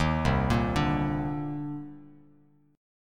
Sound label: C Minor 9th, Bass Standard; Cm9 arpeggiated; JGuitar